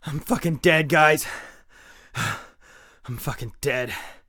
hurt neardeath; Escape from Tarkov

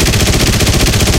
ho103 loop left, guns

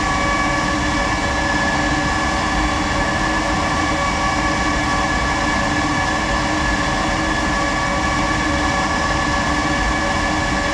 MiG-15bis Starter Loop; DCS

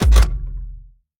gun reload; World of Tanks

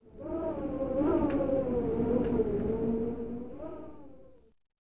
Tundra Wind Gust; Ambient; Civ4 warlords

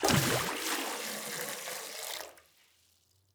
waterbucket fill submerge; Rust

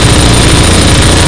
turret mgun loop stereo

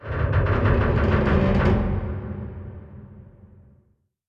submarines engines; World Of Warships

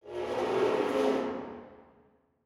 hangar layer

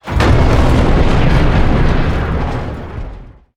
damage vehicle; World Of Warships

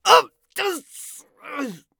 agony; Escape from Tarkov; scav3

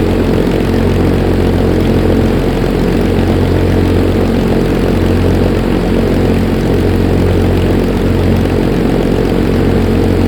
Aircrafts Engines Rolls Royce Merlin; DCS

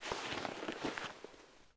Leather Creak Long; Civ4 warlords

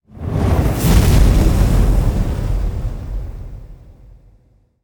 damage vehicle; World Of Warships

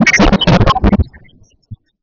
depth bomb; World Of Warships

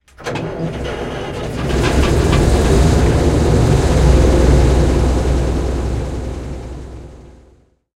hetzer engine int start, tanks; War Thunder

